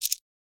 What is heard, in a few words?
comment